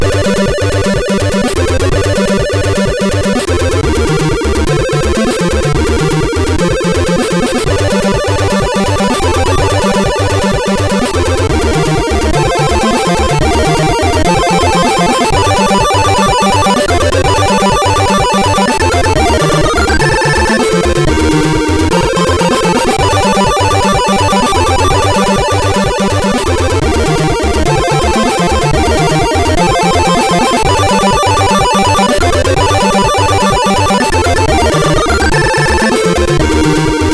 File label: Remix, SID MOS6581R3